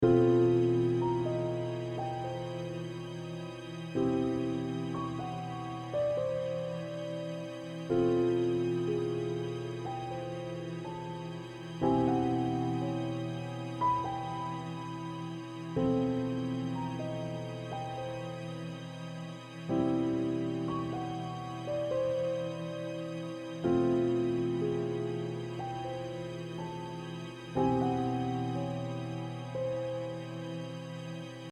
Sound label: piano strings